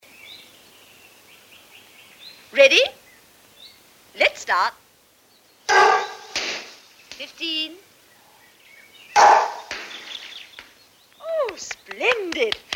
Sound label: Theme Piano; JACQUES TATI; Les Vacances de Monsieur Hulot